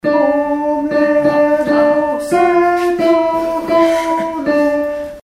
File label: O mea lux tenors